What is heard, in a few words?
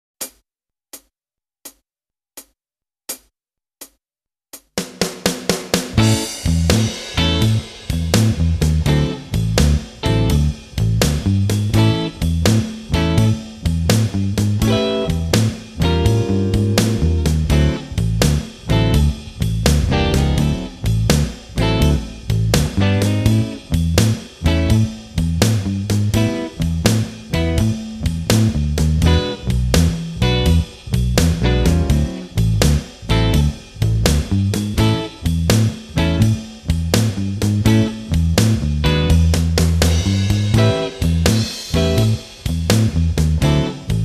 Solo Section [backing Track]